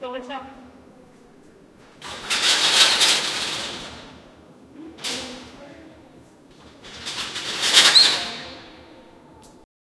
stage curtain opening; theater

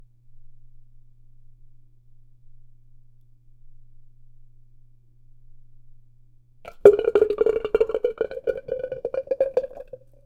milk tea boba fall into

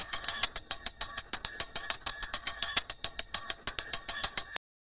cycle trin trin sound, bicycle

